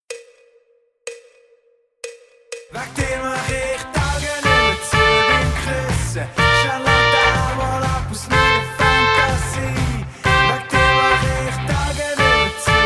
Mel Tutti Midi Refrain Motiv

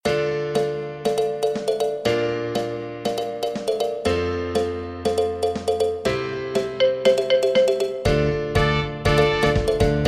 Midi ohne Stimme

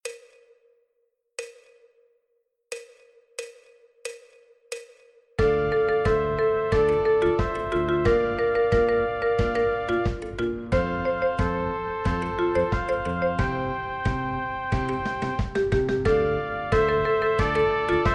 Melodieinstrumente Str langsamm

